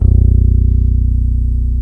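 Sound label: bass guitar A3, jazz bass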